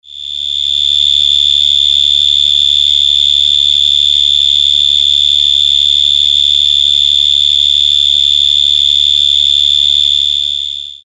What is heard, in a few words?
Gryllotalpa vineae; BIOAC ORTH